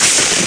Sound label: hiss